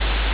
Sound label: ambience room tone